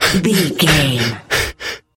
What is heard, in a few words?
voice male breath loop